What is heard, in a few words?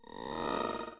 lucky Reel Spin; jewel fruits